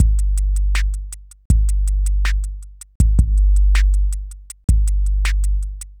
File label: DRUM LOOP ambient 160